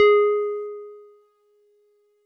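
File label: Wood Piano DX100 G#1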